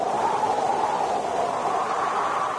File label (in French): vent